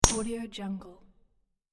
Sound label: hit gem